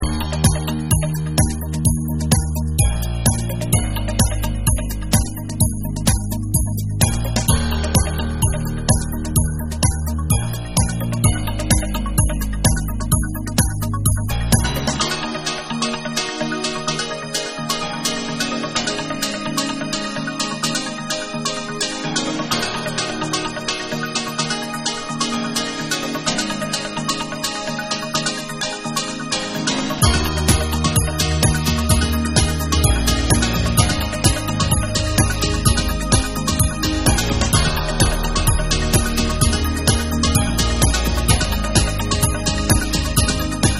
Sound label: Piano Dub Mix